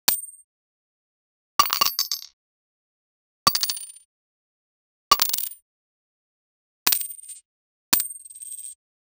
satisfying cartoon coin

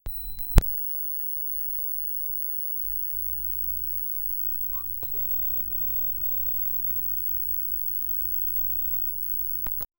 space probe beeping sound

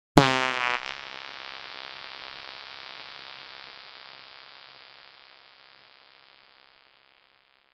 ultra short one shot fart

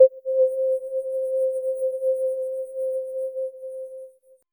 soft beep

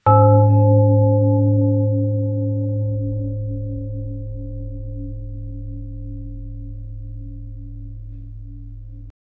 a large clear deep bell

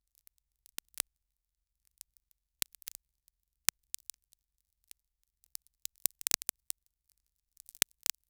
cracking

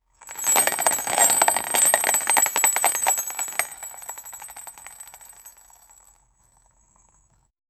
small wheel rolling

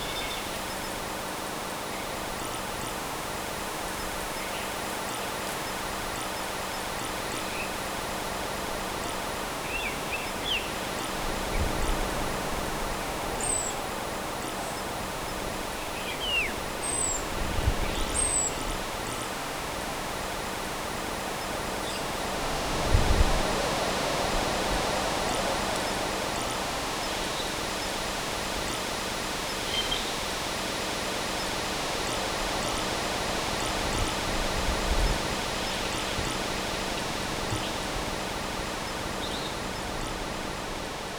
hilly island open space

